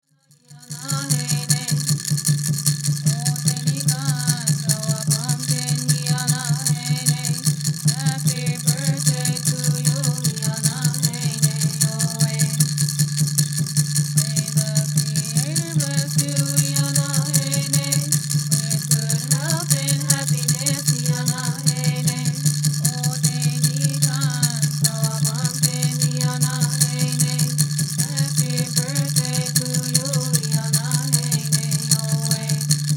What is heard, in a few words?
Native American Church Song